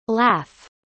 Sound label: laugh